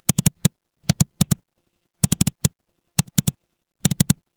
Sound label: office mouse click